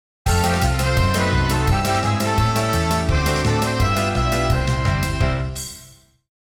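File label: game show intro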